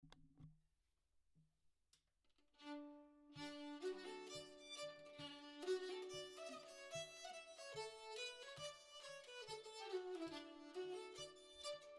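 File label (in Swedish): Schottis från Idre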